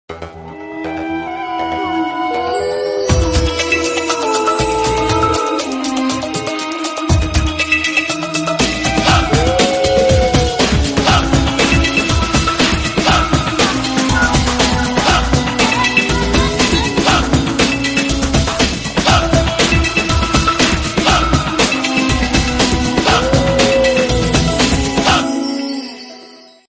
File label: Samsung Galaxy S3, Ringtone